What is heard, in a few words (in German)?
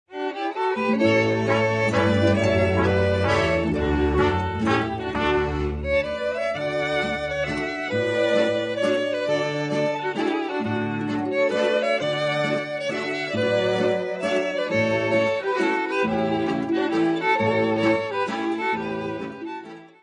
Offener Walzer